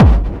BD, Dance Mega Drums, BD HOU; Best Service

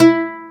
Oud E3; Planet Earth; Digital Sound Factory Planet Earth

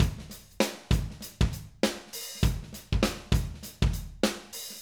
Acoustic Live Drum

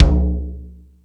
Rap Session, E-MU Pro-Cussion

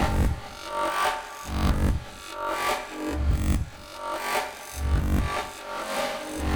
BOLERINO; Spectrasonics; Liquid Grooves 1 & 2

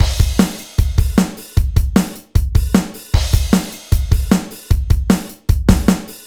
Rock Drumloop; Drumloops; Dr. Fink's Funk Factory

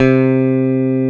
E BASS PH C2, Pick Bass

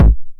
909 BD Tape Sat

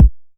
909 BD; Goldbaby Tape808 & Tape909; The Tape 909

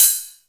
RID, Korg EMX-1, Drum Machines

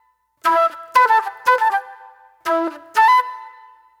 Spit Plate Flute

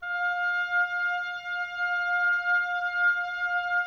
OBOE; Woodwind Brass Ensemble; Miroslav Vitous

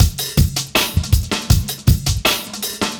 Beat, Drum N Bass

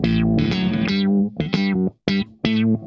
SHOT, Funk Guitar; 90 s Sample CDs; Zero G

